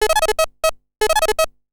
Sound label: biptone, ringtones; Google; NewWave Labs